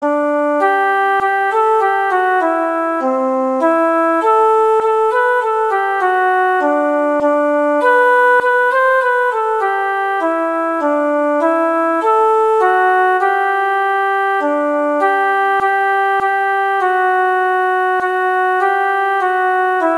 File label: We Wish You a Merry Christmas; Christmas Beginners